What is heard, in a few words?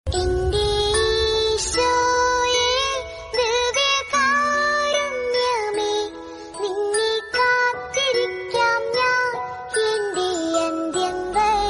christian bgm